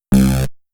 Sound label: feedback incorrect